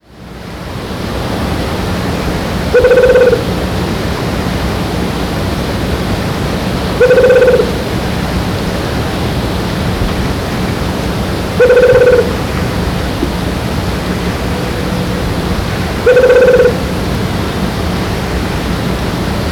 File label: Baryphthengus ruficapillus, Itatiaia NP Brazil